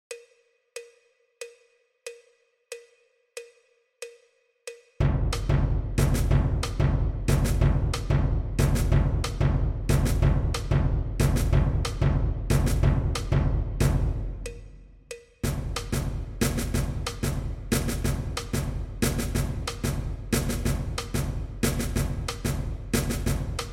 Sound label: percussion